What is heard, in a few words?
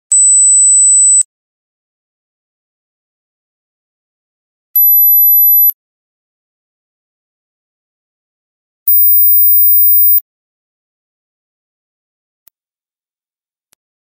hearing test mosquito ringtone teen buzz